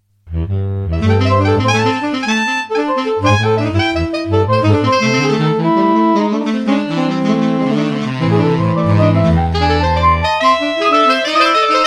Saxophone Quatro; The Netherlands

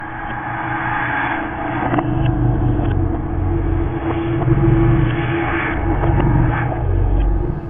Creepy Backwards Music

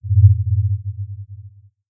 Vibrations

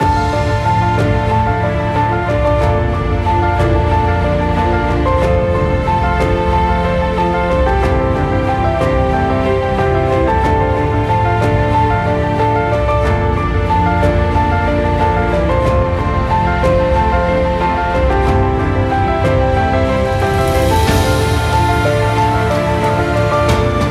Epic Cinematic Adventure Loop

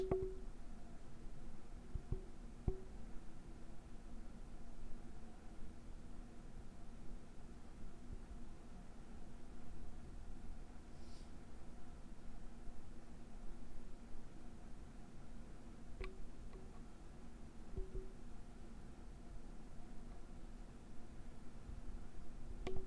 Iso Booth Ambience

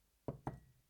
Cup Lift

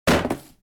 Box Impact

